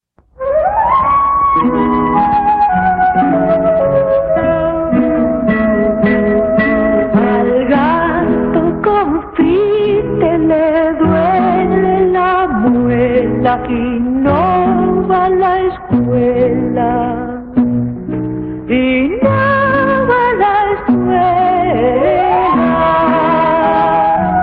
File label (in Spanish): Canción Infantil